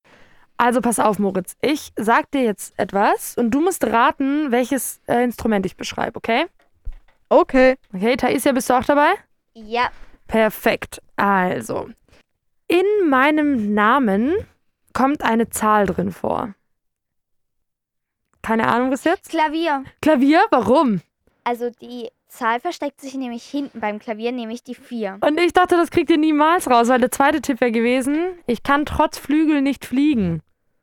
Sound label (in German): Klavier; Musikrätsel